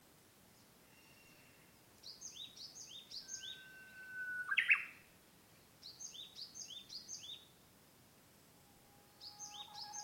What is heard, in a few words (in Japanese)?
uguisu